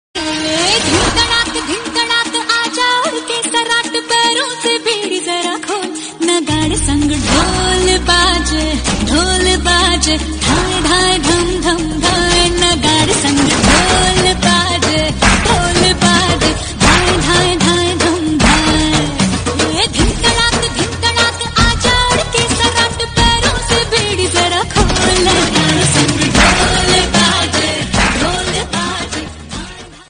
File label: Dhol Ringtone; Ram Leela